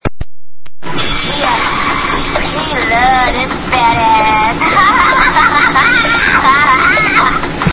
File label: drunk laugh